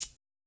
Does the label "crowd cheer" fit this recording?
No